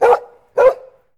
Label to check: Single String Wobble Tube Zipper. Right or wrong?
Wrong